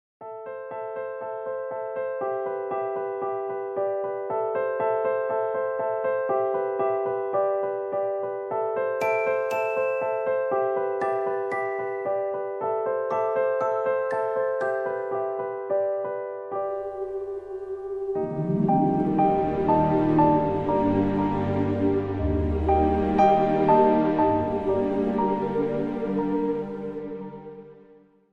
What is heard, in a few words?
transition sol